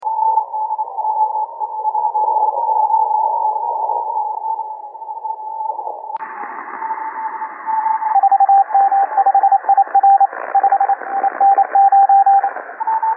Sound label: cqww cw